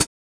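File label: Kawai ACR-20 hi-hat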